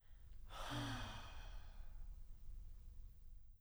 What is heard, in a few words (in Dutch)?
Zucht